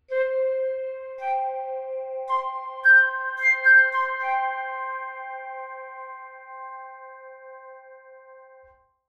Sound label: flute, Steinway B